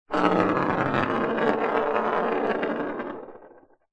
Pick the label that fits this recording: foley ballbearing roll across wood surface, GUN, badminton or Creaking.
foley ballbearing roll across wood surface